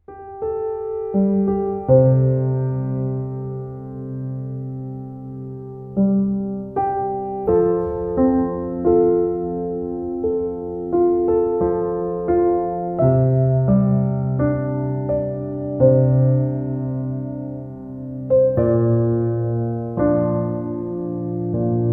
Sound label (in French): Jazz douce musique d ambiance